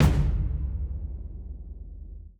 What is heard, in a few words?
saz stomp; nova sound